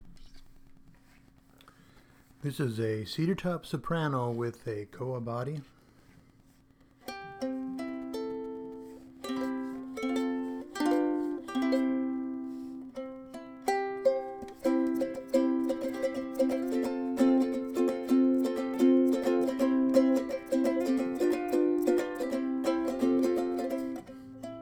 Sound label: cedar top